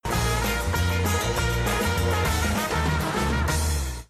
jingle; cest au programme

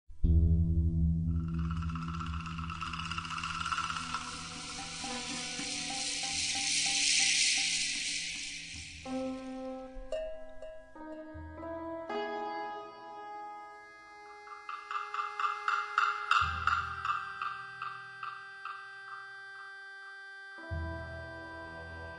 ambiance

